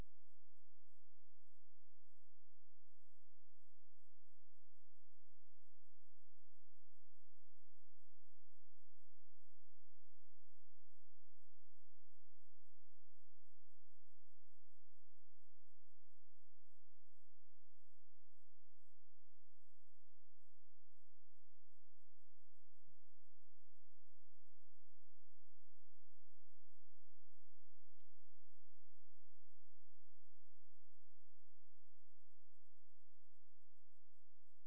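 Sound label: hydrophone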